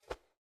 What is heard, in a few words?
cloth pull; soundscrate